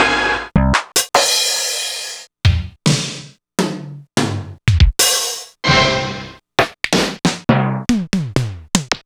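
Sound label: Roland MV8800 drum K-100 E CHIP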